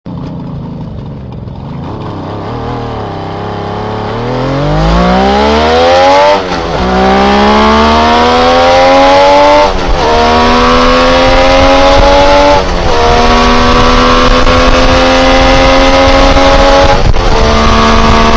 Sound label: suzuki gt750; Section Six: The Soul of a Bike – The Engine